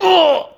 Hit; Blind Quest - The Frost Demon

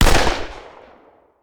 Hand Gun; Sound Ideas; Guns

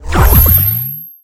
Pickup Scifi

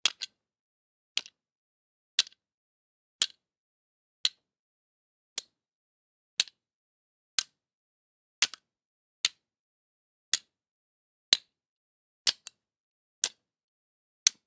bayblade collide; devices accessories utilities; NS Studios - ultra sound collection

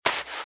Footsteps Sand Dry Run Left; RadwinIsland

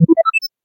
dodge success; Pitch Black - A Detective's Demise

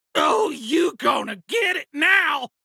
hit reaction; abe; Blind Drive

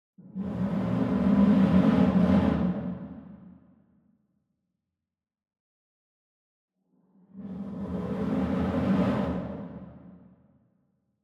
Lion Roar Far; SWEETENER; boom library; Cinematic Strikes Construction Kit

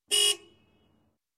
Truck Horn, Single Blast; Sound Ideas 2000 Series

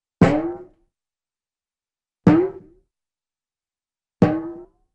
Tight Timpani Drum Impacts, Cartoon; Hollywood Edge; Cartoon Trax

